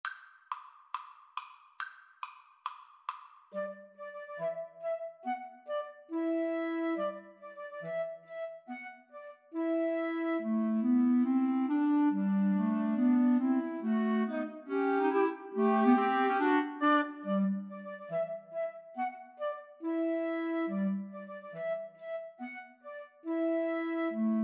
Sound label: tchaikovsky nutcracker, 2 Flutes Clarinet